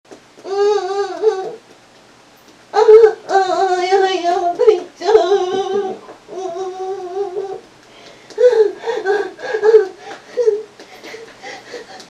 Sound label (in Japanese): えち音声